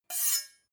two knives large scrape short

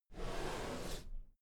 drawer cabinet equipment open soft halfway

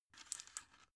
drug blister pull out soft

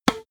tennis ball hit

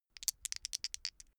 dice shake hands open short

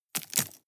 drop stones small

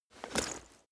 leather metal movement soft single

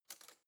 cutlery spoon drawer grab soft

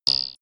cheeping spring

